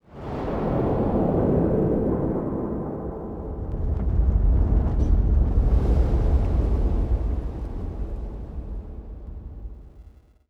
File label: city occasional